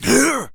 Jump, Zombies; zps; Carrier

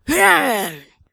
attack, Zombies; zps; Vanessa

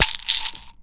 Sm Health Pack; zps

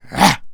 Melee Grunt, Human Cop; zps; Humans; MeleeGrunts

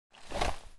IED Pull; zps; Weapons; Explosives

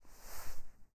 M4 Holster; zps; Weapons; Firearms

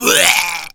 pain, Zombies; zps; Z Punk

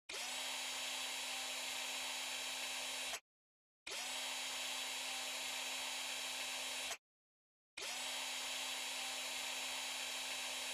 Billboard Motor; Ambient; zps

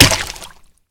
Flesh Head, Gibs; zps

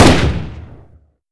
m4 fire; zps; Weapons; Firearms

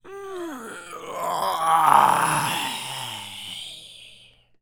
Male Taunt, Zombies; zps; Male1

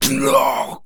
Pain, Zombies; zps; Carrier

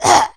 attack, Zombies; zps; Z lea

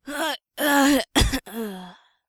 Death Rattle; zps

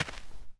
dirt, Footsteps; zps; Foley

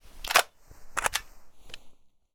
AK47 Reload; zps; Weapons; Firearms

